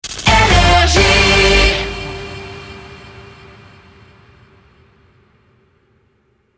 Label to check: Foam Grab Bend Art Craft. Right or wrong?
Wrong